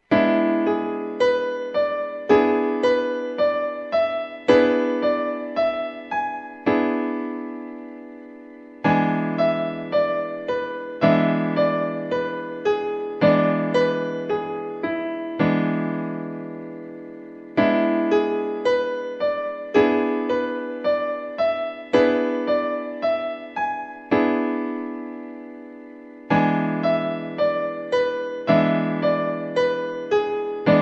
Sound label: E7 Piano Chord